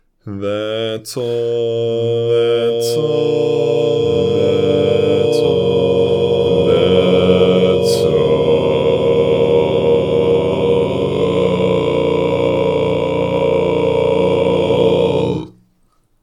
barbershop tags, all parts

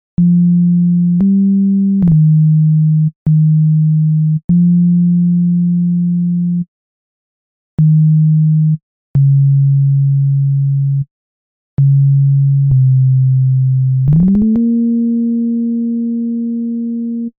Bass, barbershop

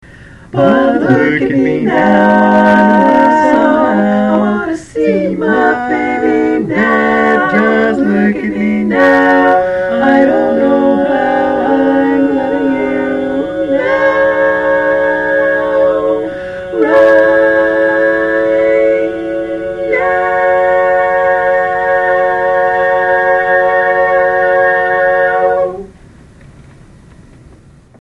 All Parts, barbershop tags

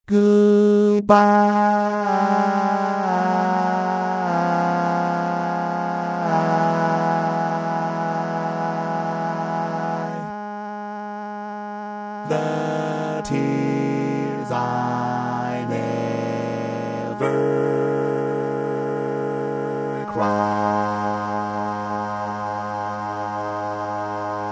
Bass, barbershop

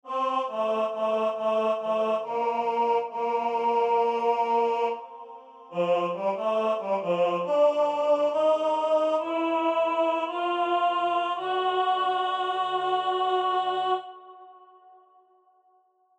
Tenor

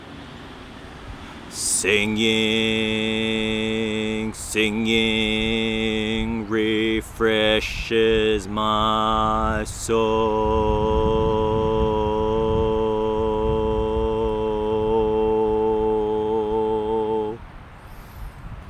Bass, barbershop tags

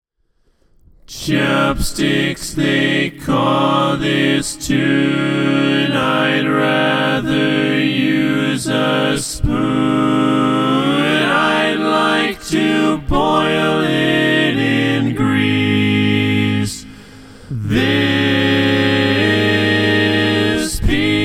All Parts, barbershop tags

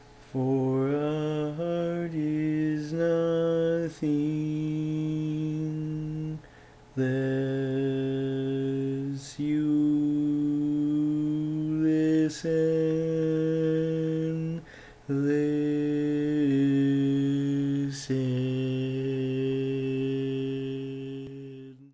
Bari, barbershop

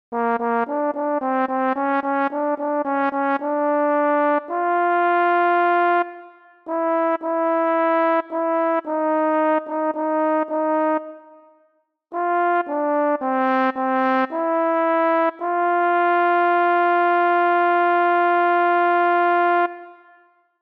Lead, barbershop

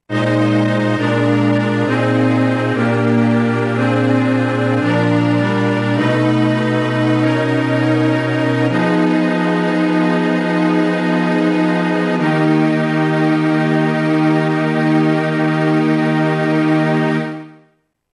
All Parts, barbershop tags; The first Noel

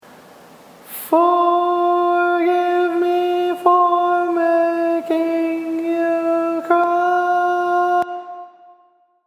Tenor, Barbershop Tags

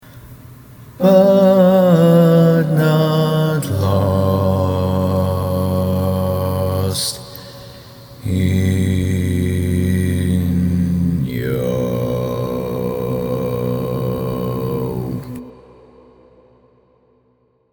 barbershop tags

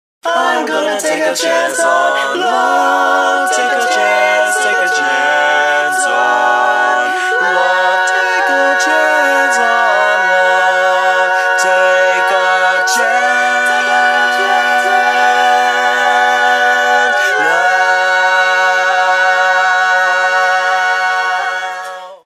All Parts, barbershop tags

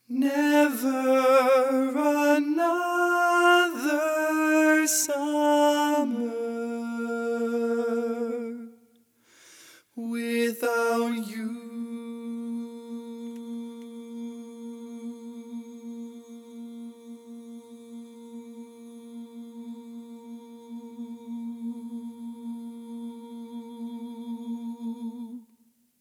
Lead, barbershop tags

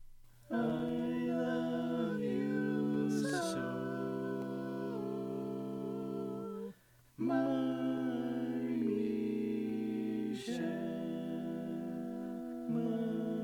All Parts, barbershop tags

